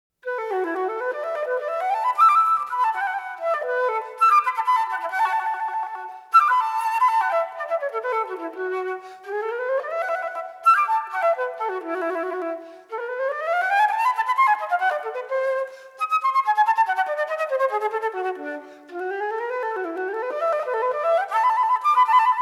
fountain music

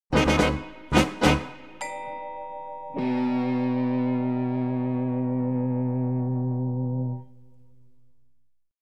slapstick effects

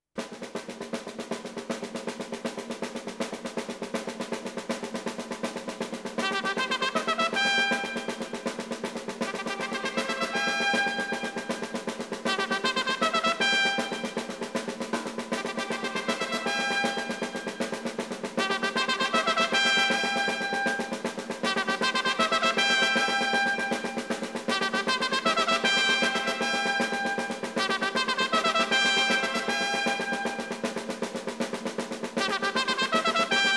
battle charge

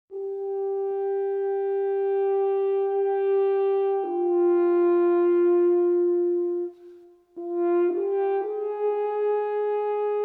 scenic french horn

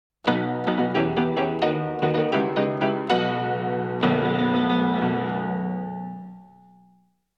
piano flourish

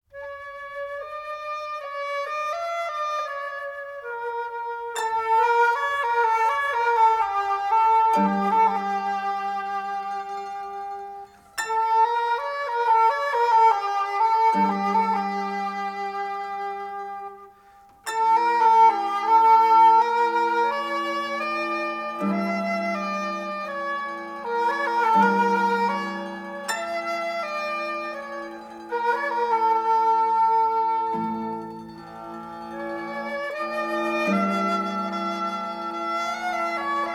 two turkish folk songs slow

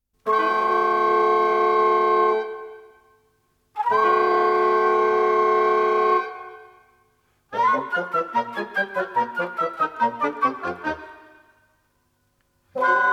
tentative steps

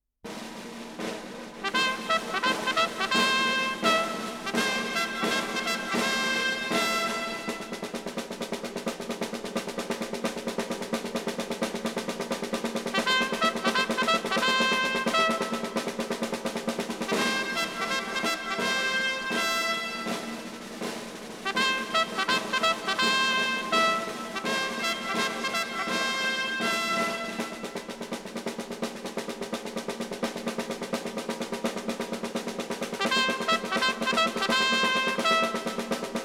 battle rally

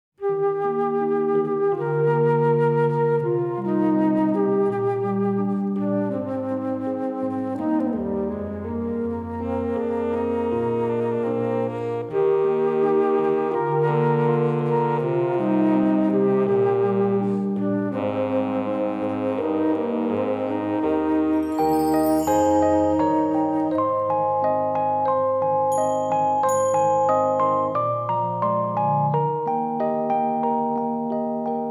melody for a flute

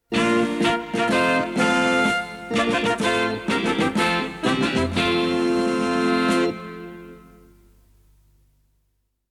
mini fanfare

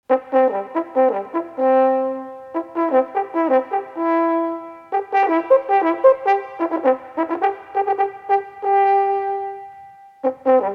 calling horn for french horn

